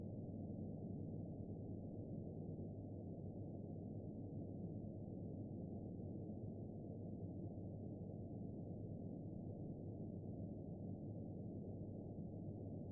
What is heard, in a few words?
Under Water Ambiance